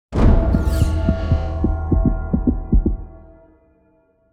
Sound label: UI Reanimation; we are the dwarves gamerip 2016